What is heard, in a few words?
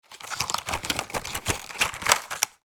Pharmacy box shuffling medicine packages